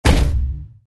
Hit; Fancy Pants World 1 Remix

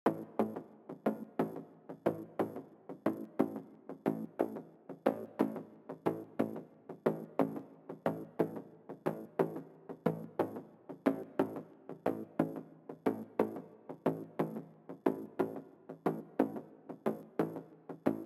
Bass I

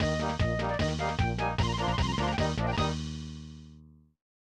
Rugby Start; Asterix Obelix; SNES

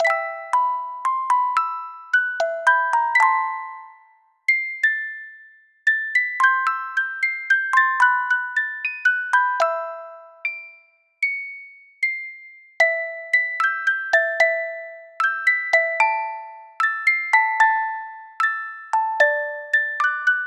Lament (Music Box); animal crossing new leaf welcome aamiibo soundtrack

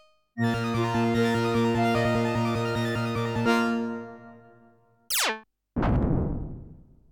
Space Ship appears fanfare; Flash Point; Bloxeed

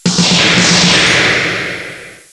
Winning; ABC World Reference Wide World of Animals

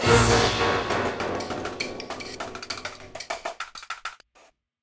Fight High Fail Combo; Remember Me

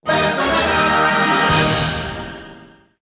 Goal Fanfare; crash bandicoot series sound box

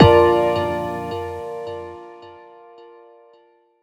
Retry Jingle; Friday Night Funkin' Funk Space OST mod Windows gamerip 2023

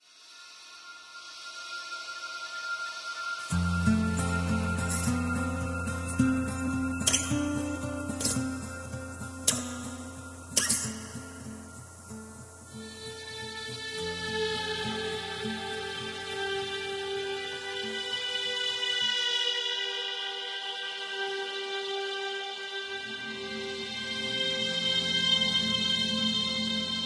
Harbour Sting; ether one redux gamerip 2015

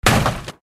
Impact

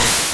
Body Slam; sfx; attack moves; pokemon; gen 1; rby

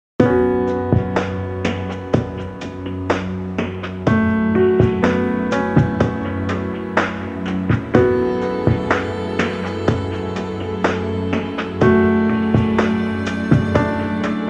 noise chord; Floral Flowlove

